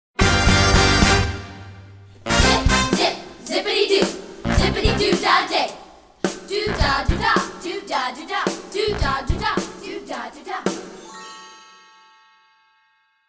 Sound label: Playoff